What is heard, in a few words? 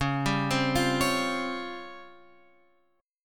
Db Major 7th Flat 5th, Guitar, Open F; DbM7b5; arpeggiated; x,8,7,7,8,8